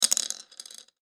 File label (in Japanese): 漆器で チンチロリン